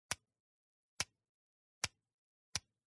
Camera Film 35mm SLR Aperture Ring Click F stop Change Lens; CANON A1; MKH8040; Canon FD